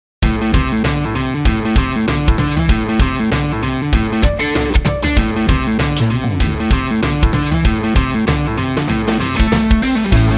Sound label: Heavy Rock